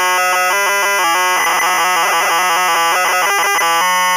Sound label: loading sound; jdd opendingux